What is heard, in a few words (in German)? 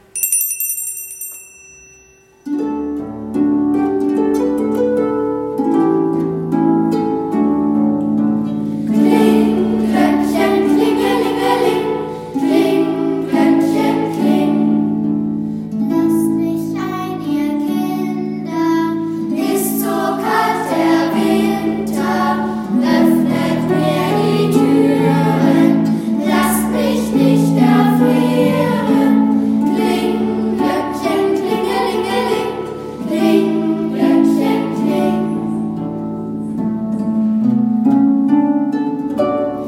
Klinggloeckchen Tonaufnahme; weihnachtsliederbaum 2024